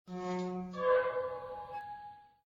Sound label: Gate Open Escape